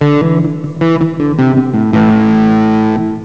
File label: medium empty room